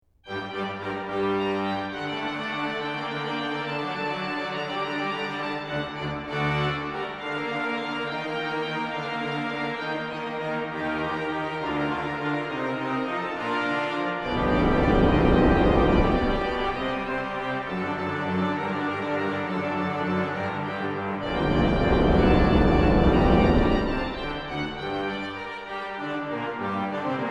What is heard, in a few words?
Grand Chorus with Thunder